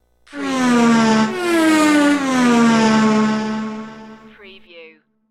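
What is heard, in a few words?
Speed Cars Pass By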